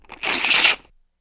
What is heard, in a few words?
paper ripping